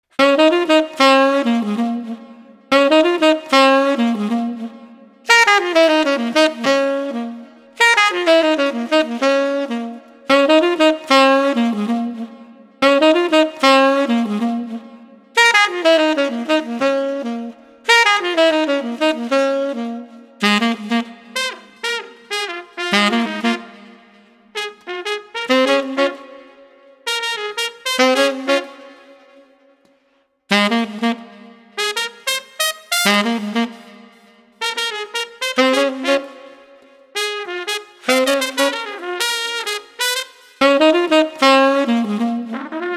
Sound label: Sax Trumpet Groovin 95